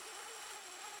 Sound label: Rappel Loop; AdvancedRappelling